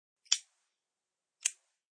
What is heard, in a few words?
Flip Lid lighter opened and lit.; Flicks; Objects